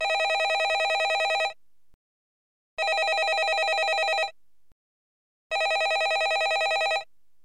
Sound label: Office Telephone